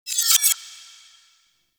Sword Enemy UnFrozen; Weapons; Melee; Warframe; Orokin Weave Sword; Weave Boss

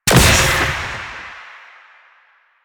Choir Erupt Proj Explosion; Warframe

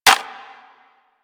Shotgun Reload Handle Foley; DE; Warframe; Weapons; Skins; Zoboz Shotgun Skin

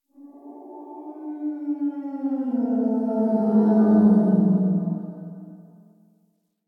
Exterior Distant Calls Angry, Ambience; Warframe; Duviri

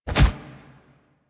Charge Body Impact; Enemies; Purgatory Warrior; Warframe

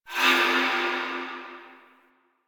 Vaults Keyglyph Fade Out; Warframe; Entrati

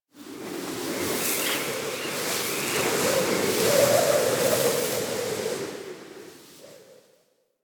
Venus Landscape Wind Gust Med; Ambience; Warframe; Venus Open World

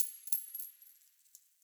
Coin Drop; Warframe; Ambience; Nineteen Ninety Nine

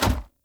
Heavy Grunt Boot Walk Gravel, footsteps; Sinecure

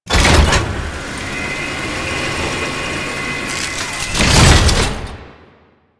Elevator; Warframe; Entrati; Necramech Hack

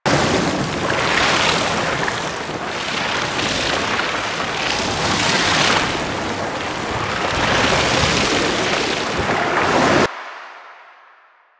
Archgun Charged Radiation Field Loop; Warframe; Archwing

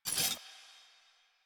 Idle Sword Drop

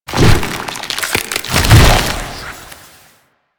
Proto Infested Rolling Rot Start; Warframe; Babau; Abilities

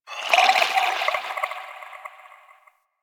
Tendril Tree Creaks; Ambience; Props; Warframe; Infested Eris

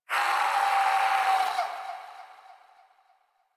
Shadow Vocal Idle; Warframe; Duviri

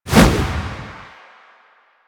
Finisher Whoosh A; Finishers; Enemies; Warframe; Kuva Lich

